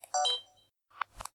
camera off; Apotheorasis

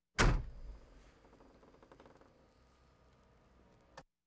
Automobiles various

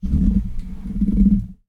Handle Microphone; Miscellaneous; 1001 Sound Effects

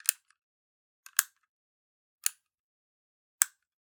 Generic Button Plastic Tiny

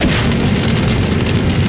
Flame Thrower Shot Loop; Weapons

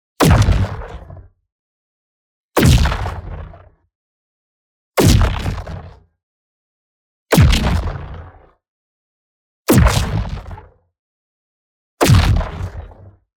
EXPLOSION SMALL Distorted Fracture; boom library; Matter

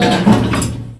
Drop Hatch Stop; Doors

